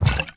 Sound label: jug pickup; ui; inventory